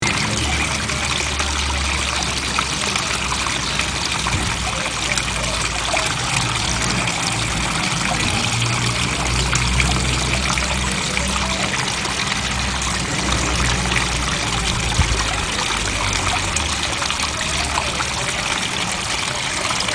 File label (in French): bruitage eau